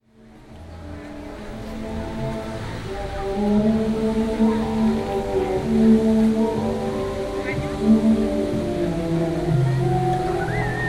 fountain, gorky park; sergiyev posad

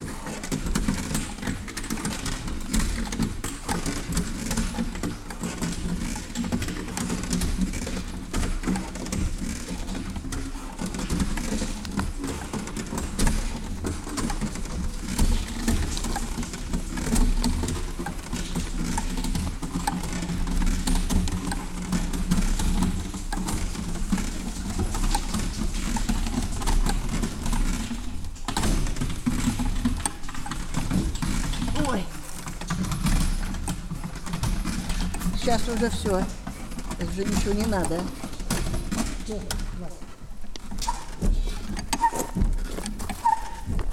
sharapovo water well, morning

